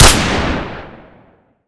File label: kriss shoot, weapons